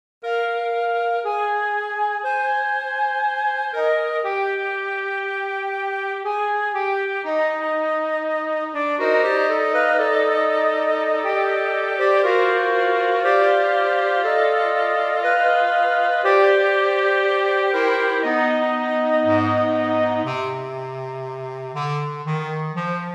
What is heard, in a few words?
Woodwind Ensamble